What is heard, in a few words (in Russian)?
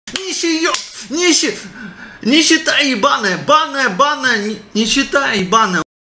tekhno